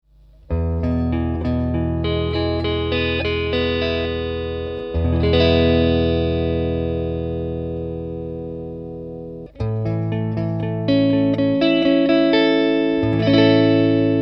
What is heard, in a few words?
NECK CLEAN